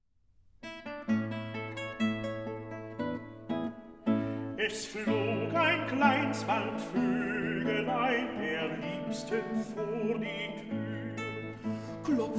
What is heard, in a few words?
song for voice & lute